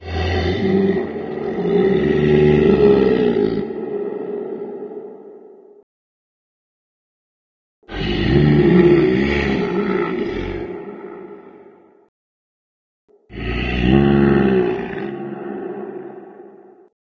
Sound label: creepy moaner